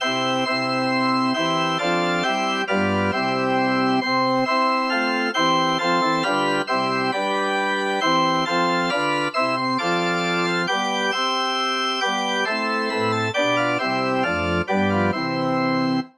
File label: organ